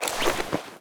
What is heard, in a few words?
deploy, weapons, deagle; garrysmod; fokku tc